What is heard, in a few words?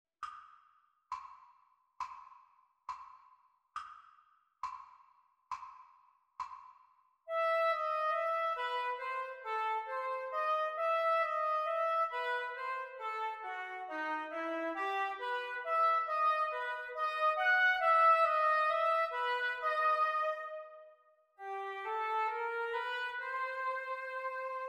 oboe duets practice